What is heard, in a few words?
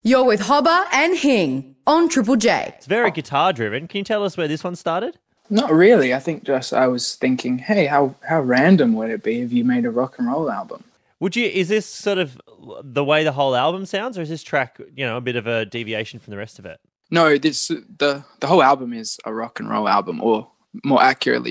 new music guitar